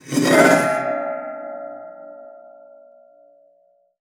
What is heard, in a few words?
GLISS, SPINET; Xsample VOL-7